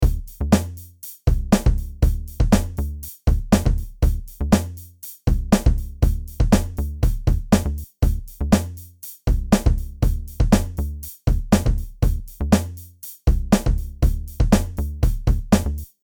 Distortion

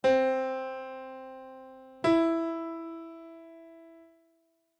music intervals major third

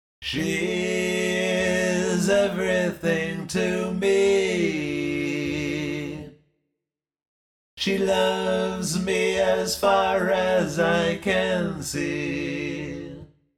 vocal harmonics

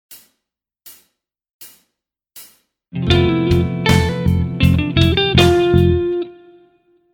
Modes Lydian lick

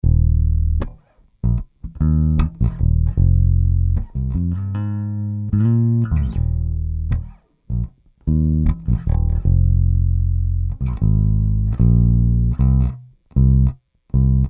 bass di

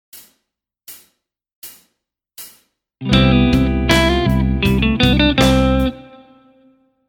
Modes Ionian lick

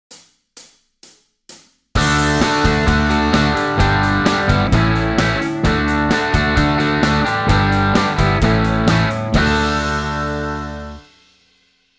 major chords

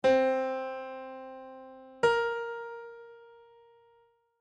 music intervals minor seventh

